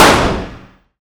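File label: fire distant 45acp pistol interior; gmod; arccw uc commons attachments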